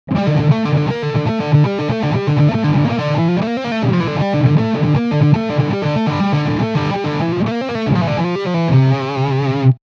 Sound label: Heavy Lick Standard